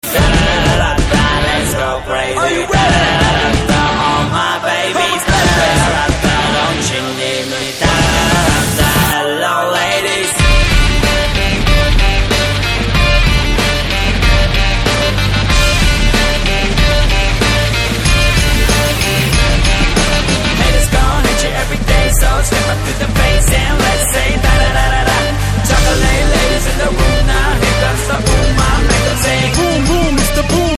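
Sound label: background Ringtone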